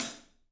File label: ZILDJIAN HT; 3D Sound; 3D Drums